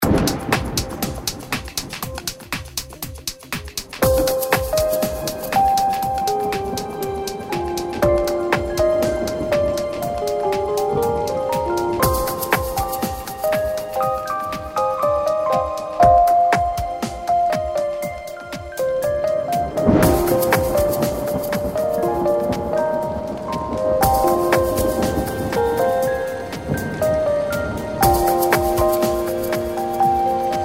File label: Trickle To Rain Storm